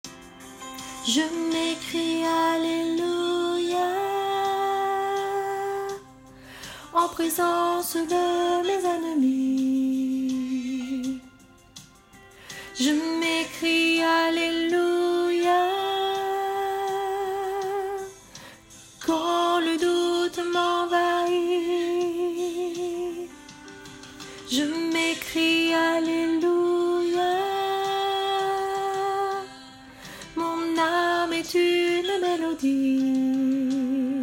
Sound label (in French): Voix haute couplet; Voix mezzo couplet